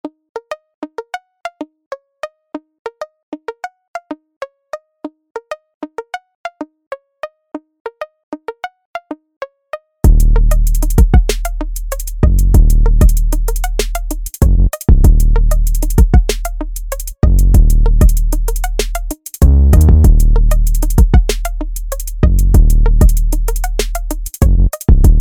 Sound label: Instrumental